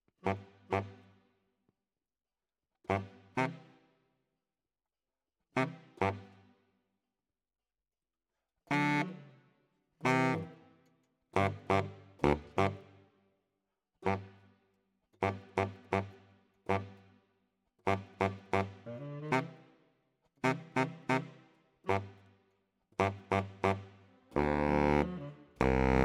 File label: Bari, RNR for piano